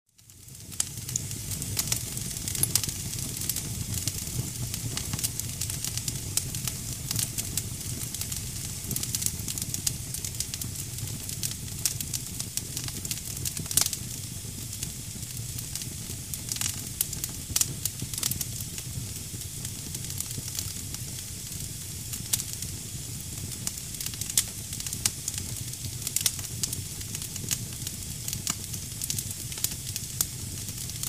kamin gorit

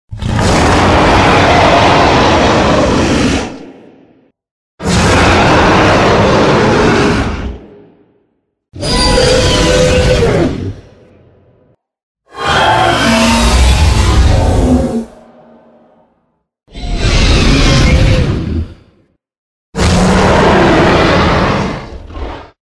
ryk drakona sbornik

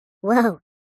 zvuk vau multyashnyi

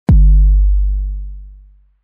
zvuk bassov

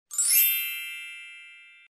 zvuk kolokolchika

